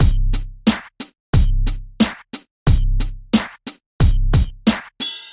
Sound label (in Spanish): claqueta refuerzo caja